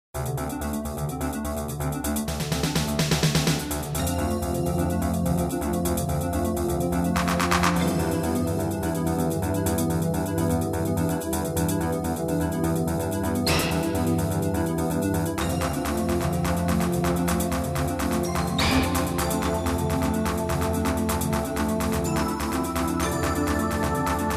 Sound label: Jingles; 90 DDD Jingles